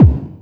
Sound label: bass drum, Drum & Bass; Ueberschall; Drum & Bass Resonance